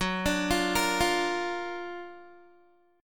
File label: Gb Augmented Major 7th Gb down Guitar 4E,4A,5D,5G,5C,6F; Listen to Gb+M7 arpeggiated